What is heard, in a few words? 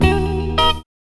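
GUITAR RIFF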